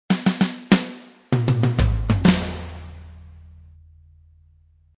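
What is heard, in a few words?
Loop Drum Fill 98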